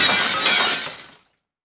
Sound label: window break